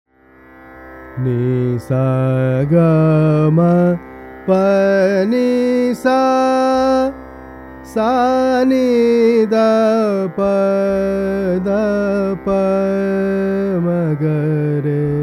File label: Raga Pilu